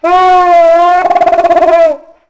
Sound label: Call, Dino; Ourano